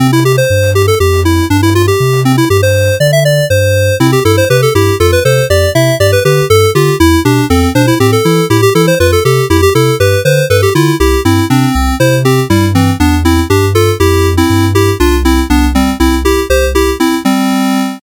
Heroic adventure with opening, mid section, and outro, nes sound generator